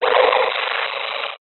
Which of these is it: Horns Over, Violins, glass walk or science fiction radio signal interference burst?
science fiction radio signal interference burst